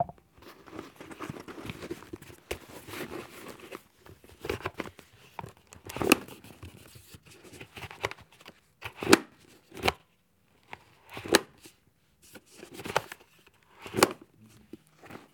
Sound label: Helmet Motorbike Put On A Head